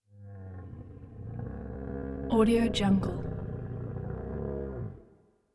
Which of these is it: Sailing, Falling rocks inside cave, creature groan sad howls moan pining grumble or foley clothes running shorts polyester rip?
creature groan sad howls moan pining grumble